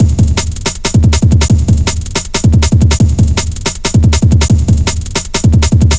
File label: Drumloops, 160bpm; Drum Bass; Zero-G; Total Drum Bass